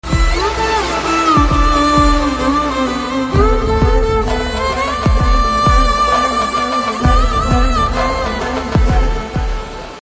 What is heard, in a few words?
Romantic Heart Touching Ringtone